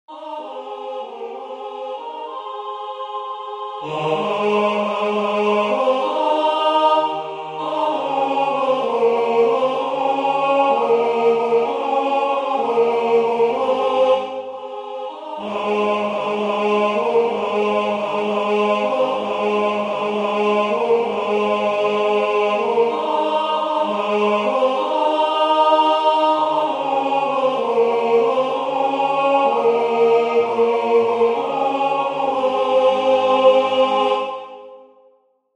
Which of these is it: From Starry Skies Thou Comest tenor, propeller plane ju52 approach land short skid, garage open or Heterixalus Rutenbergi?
From Starry Skies Thou Comest tenor